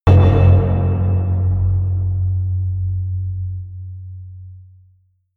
sub metal impact, hits impacts

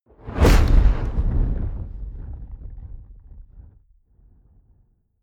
fire whoosh bright; swooshes-swishes

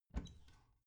bathroom cabinet door creak open heavy

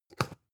spice jar big scratch close short; industrie wirtschaft; gastronomie

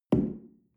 shell place on tablecloth medium; soundpool

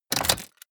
sci-fi click metal heavy

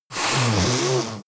yeti nose blow strong

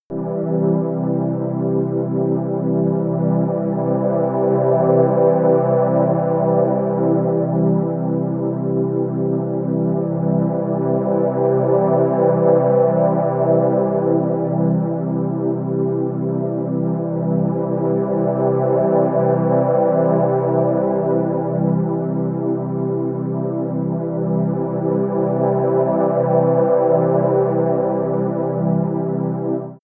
relaxing energy, music elements

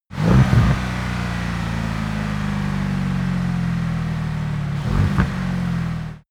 car mercedes amg c63 s deceleration rev

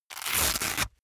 boxing gloves open velcro; sport freizeit; boxen

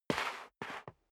step sneaker concrete scuff; schritte

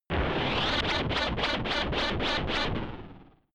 shot wobble lurking tension; pulsieren

